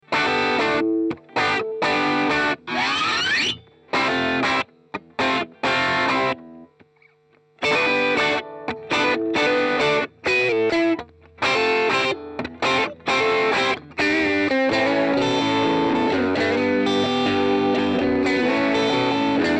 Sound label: tele 50s open a vibrolux